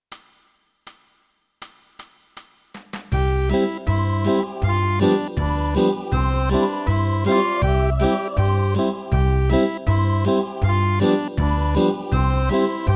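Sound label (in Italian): Giovannin, Fisarmonica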